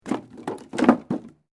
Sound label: WOOD PLANK MOVEMENT